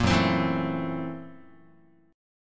F sharp 7th Sharp 9th Flat 5th Guitar, chord; strummed; JGuitar

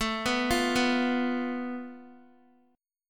A Suspended 2nd Sharp 5th, down, Guitar; Asus2#5; arpeggiated; 4F,4A,5C,5G,5C,6E; JGuitar

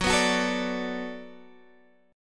B Minor 7th F sharp, chord

